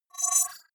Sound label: wobble swell constant short; sweeps-swells